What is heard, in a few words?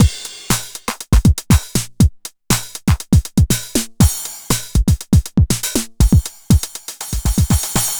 909 CLEAN; Wizoo - Platinum 24 Electronic; DEMO KIT